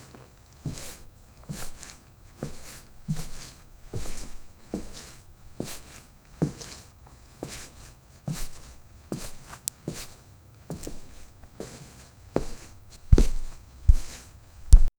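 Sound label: footsteps carpet slow walk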